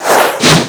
Skill Cross slash; BOMBERGIRL RAINBOW; Arcade

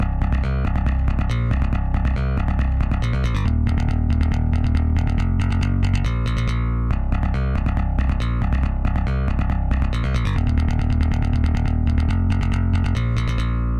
Drums and Jingles Camping Bass

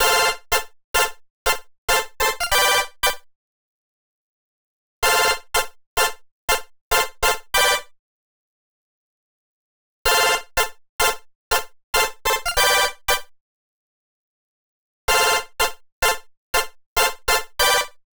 8 bit melody; vostok inc. switch gamerip 2017

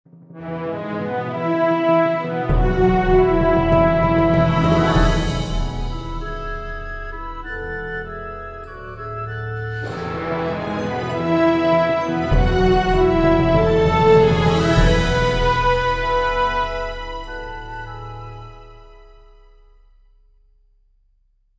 Jingle Positive

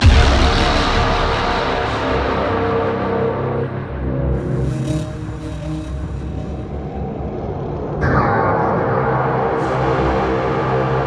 Music In Game Menu; Hellbound

